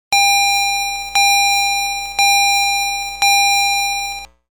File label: Quad Variable Gong